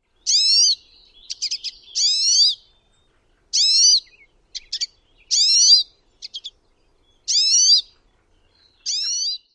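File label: calls, Pine Siskin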